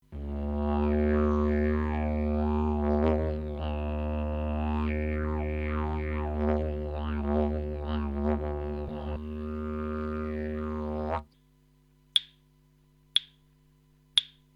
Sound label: Didjeridu and clapp sticks Solo track; didgeridoo